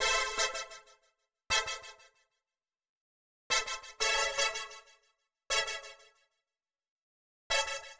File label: 120 Pad A stabs, Keys, Nu Jazz House; PowerFX